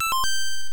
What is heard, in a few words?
startup